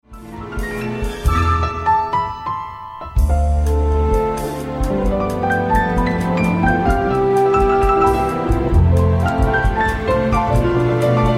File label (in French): marche harmonique